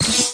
Clicky Gadget Things

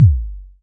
Pearl SC-40 Kicks

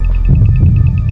deep suspense; Weird and Interesting Hits; single hits

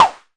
mbrute sn, Arturia MiniBrute

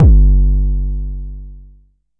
Jomox MBase, drum machine; Jomox MBase 01

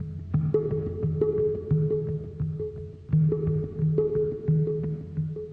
087 far away ethno binks, melodic loops

